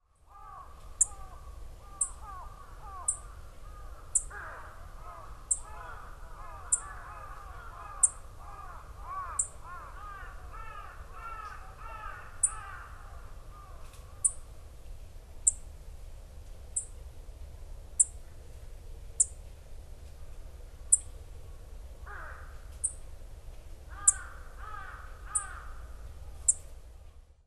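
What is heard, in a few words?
Black-faced Bunting; aoji; hyogo; koe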